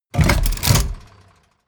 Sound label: tank small gear shift